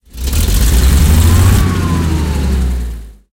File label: hetzer engine load, tanks; War Thunder